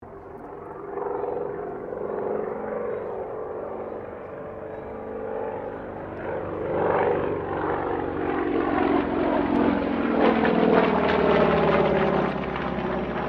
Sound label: AIRCRAFT CAC WIRRAWAY LOOP; CA-16 Wirraway; Airplanes; Vehicles